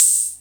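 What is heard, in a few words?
78 open hi-hat; 300 Drum Machines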